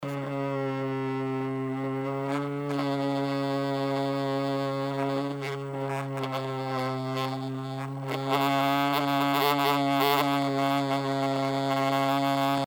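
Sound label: vibration sound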